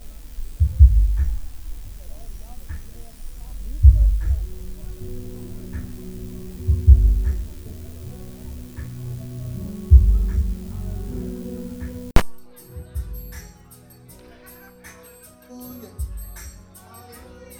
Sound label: Church Music (Recorded With Broken; Church Recorded Using Broken Microphones; Church Recorded Using Broken Microphones II; lolicore